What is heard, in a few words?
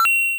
MOVE; C64 Dreams; Multi-Sound Default